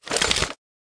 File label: pickup; superheroes2; squareinch